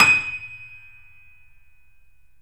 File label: D#, 3-D Audio Collection, 3D Pianos, Yamaha C7; E-MU Producer Series Vol. 5